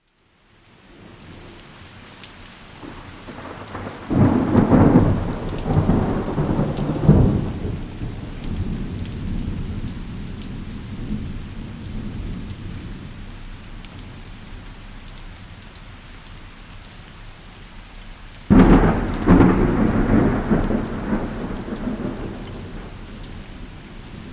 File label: Thunderstorm